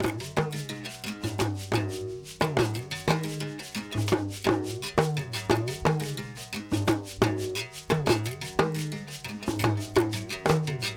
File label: Combination, World Percussion, Xylo, Drones, Shakers, Talking Drums, Stick Drum